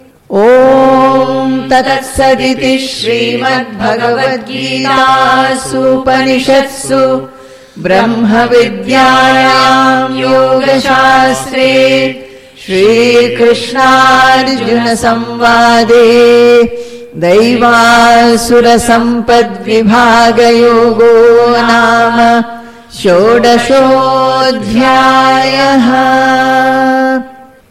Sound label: Ending Chanting; chapter 16 daivasura sampad vibhaga yoga; bhagavad gita; conclusion